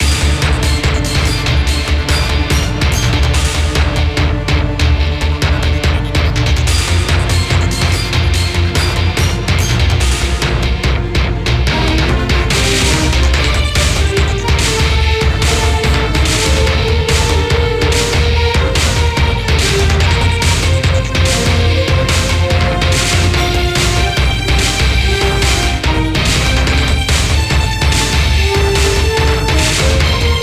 Jingle Mission Failed

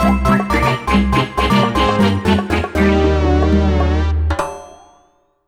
(Jingle) Fail

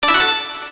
Credit SFX

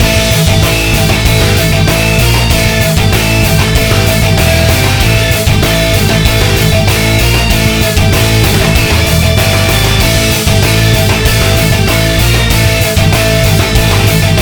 Battle Loop; The Legend of Gwen; Windows

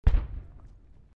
Flak Shot

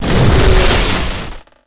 Smash House Break Apart

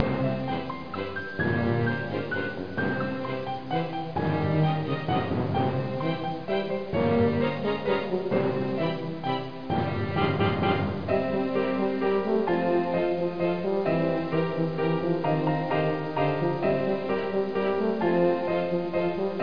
Orchestrated Waltz; Spy Fox in Dry Cereal

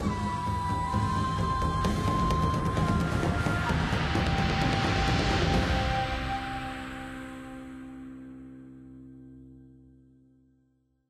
SWAMP WAVE COMPLETE; DOJO; The LEGO Ninjago Movie Video Game; PS4; Windows; Xbox One

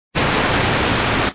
Waterfall; Super Mario 63

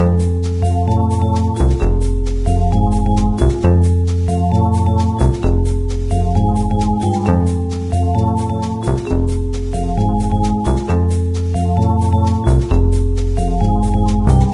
Spaceship Music; Diner Dash: Flo on the Go; NDS

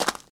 Foot Gravel Shoes Walk; Lucius III

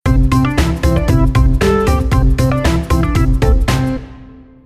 Jingle END CLEAR; Frogger Helmet Chaos; gamecube

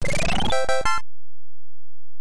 Miss; Babel no Tou; nes

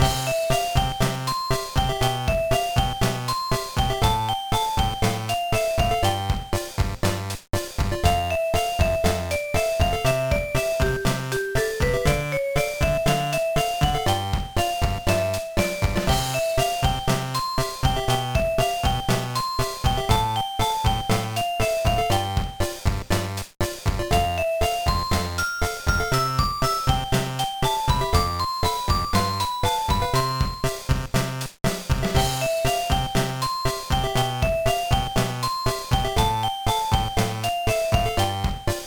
BGM; Kawaii Pet Shop Monogatari 3; gba

